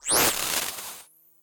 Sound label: futuristic user interface transition; BluezoneCorp